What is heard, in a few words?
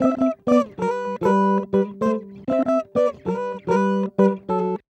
Acoustic Guitar 100 bpm C, African Guitars; Quazibeat; African Secret